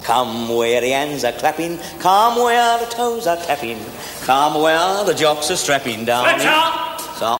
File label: come where the hands are clapping come where the toes are tapping